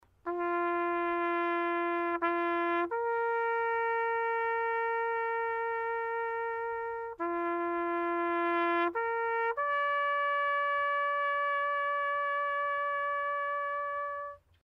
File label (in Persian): صدای شیپور